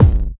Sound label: Kick Burned